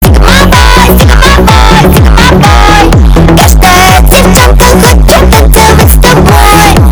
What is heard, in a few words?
bass